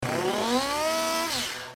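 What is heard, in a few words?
Mechanism Fast Hi Rev, servos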